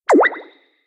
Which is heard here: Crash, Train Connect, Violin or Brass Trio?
Train Connect